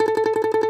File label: DOMRA, Plucked Strings, Segundo Domra